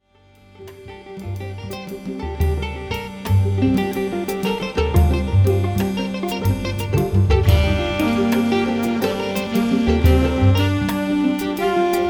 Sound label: blues circle